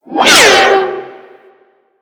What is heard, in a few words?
Corpus Energy Projectile By